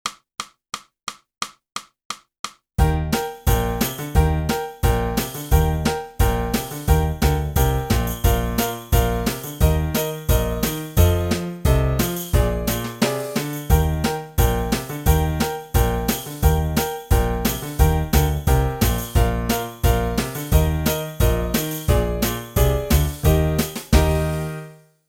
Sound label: sax